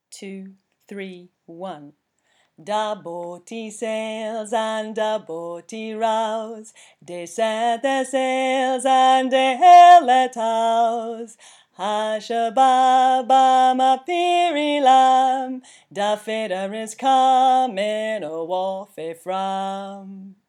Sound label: Da Boatie low part, Folk Choir music